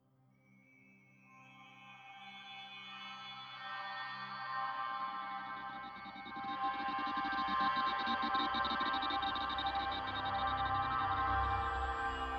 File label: pads and pulses